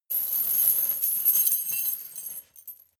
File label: metal chain midsize handling slow